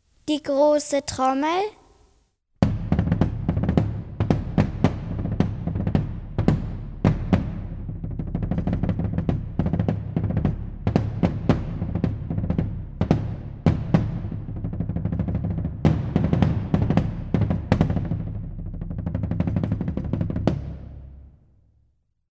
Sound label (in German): Grosse Trommel; Bilder einer Ausstellung